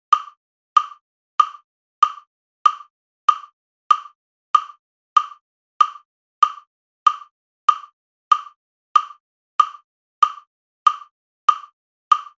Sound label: metronome G minor 95bpm 440hz